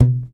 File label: YAMAHA 5 MUTE B2, Bass